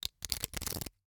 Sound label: Hwatu, cardgame, hardplastic, small, clatter, stack, drop, loose; Eiravaein Works; Vaeyan IV